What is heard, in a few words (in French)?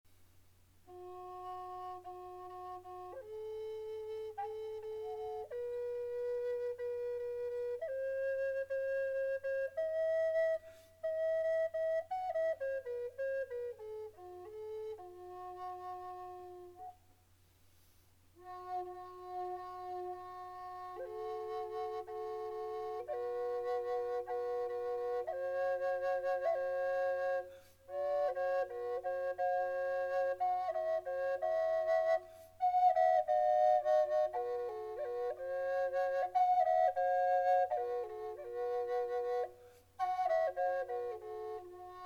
flute triple cerisier erable FA diese 432, triple flute amerindienne